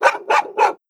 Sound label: Dog Bark